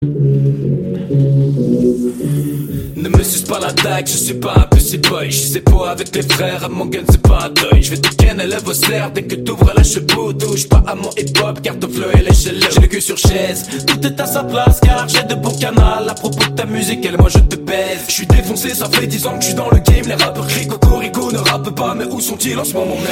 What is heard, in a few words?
Rap Francais